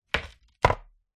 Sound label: sport skateboard set down on deck; zapsplat